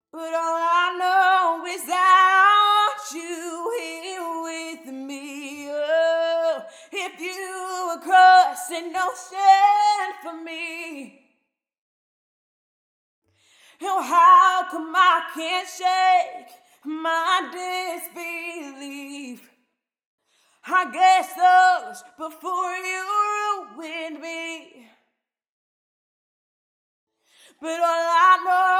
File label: Vocal Ambience; Precedence Breeze2